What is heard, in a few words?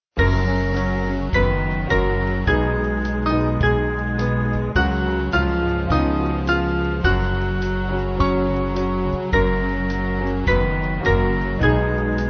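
Band, Music Backing Files for Churches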